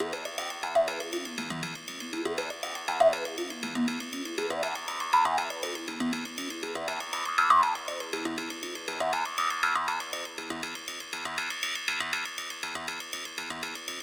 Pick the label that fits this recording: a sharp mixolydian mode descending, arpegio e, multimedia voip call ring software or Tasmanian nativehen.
arpegio e